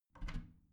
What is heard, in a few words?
bathroom cabinet warped open soft; objekte; tueren